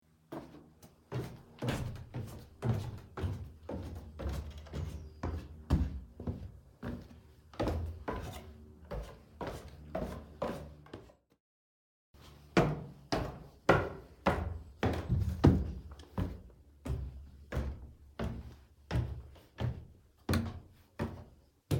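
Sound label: wooden staircase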